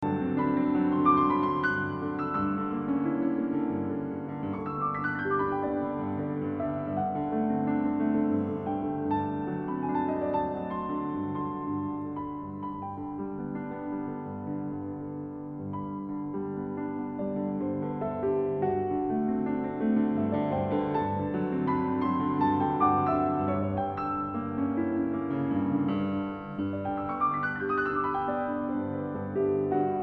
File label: Andante Spianato in E Flat